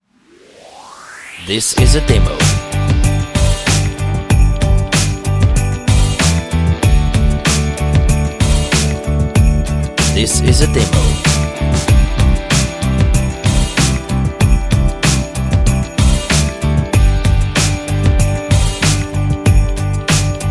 Christmas Rock Guitar and xmas bells 95 bpm; MUSICBED

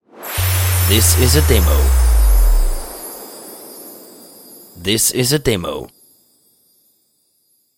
Xmas Soft riser bass and bells

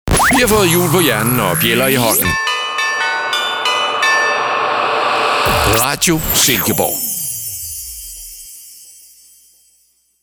Xmas ID

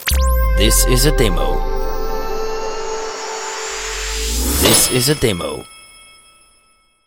Xmas Sweet rising bell

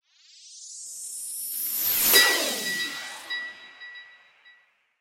Xmas Rasing the glass

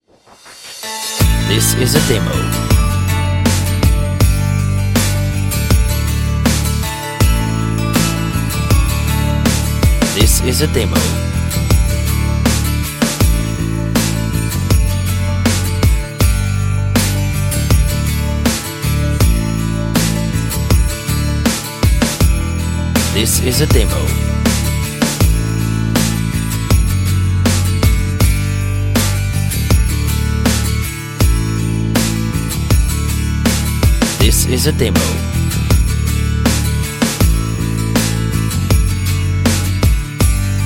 Christmas Pop Christmas with guitar love 80 bpm; MUSICBED

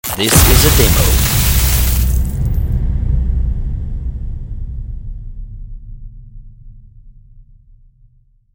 Electric Boom

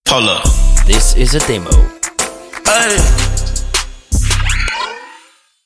Hip Hop RnB Short beat

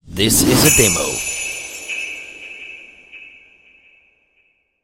Xmas Santa down the chimney

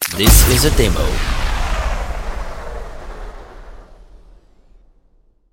Ba Boom

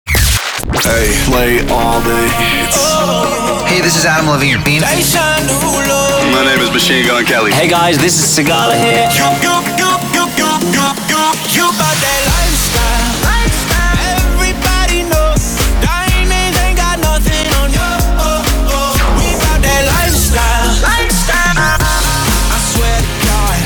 126 bpm; PROMO MUSIC

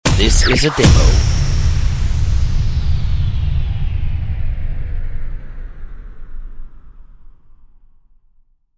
Beep Crash Boom

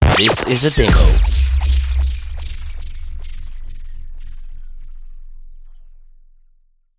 Xmas Santas Sleigh breaks down

